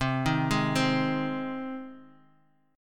Ab Major C

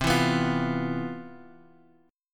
C Major 11th C; CM11 strummed; JGuitar

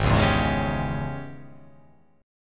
G sharp Major 7th Suspended 4th Sharp 5th

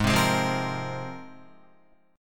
Ab 6th Add 9th, Guitar; Ab6add9 strummed

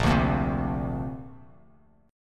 Bb Major Add 9th, Bass Standard; 6,5,8,5; strummed

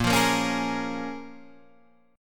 Bb Minor 7th; Bbm7 strummed

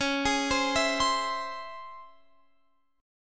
Db Minor Major 7th Db down Guitar Open F; arpeggiated; DbmM7

